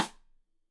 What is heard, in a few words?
Side Stick Stereo; Deeply Sampled Drums